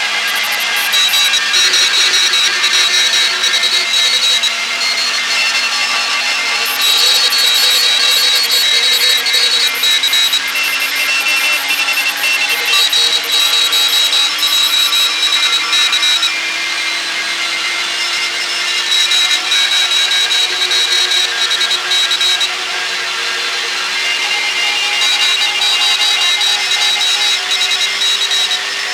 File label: CW concert 14MHz